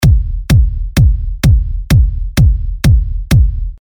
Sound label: All EQ